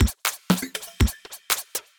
Kit, Effected Drum Kits; Kit-LD Compuspank; Ableton; Factory Packs

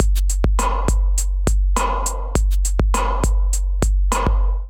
Kit groove 102 bpm; MIDI Clips; Mixed Overseas; Ableton; Drum Essentials

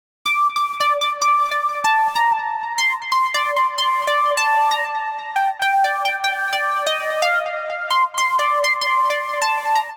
Raindrop Lead Gm 95 Bpm; MIDI Clips; Music; Ableton; Factory Packs; Vibrations; ModeAudio

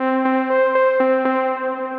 Heliotropic, Synth Rhythmic

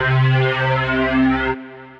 Pad, synth pad; Pandora Pad; Ableton; Factory Packs; Singularities

